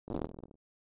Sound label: ball sound, steel ball soil ground